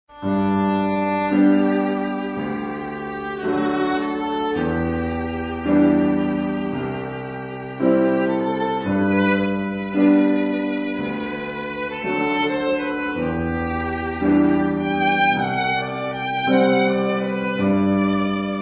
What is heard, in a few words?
wedding ceremony violin piano